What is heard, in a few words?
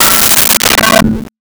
Metal Hit